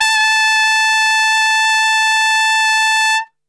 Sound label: A, Brass; Best Service; Brass Super Section; TRP STRGHT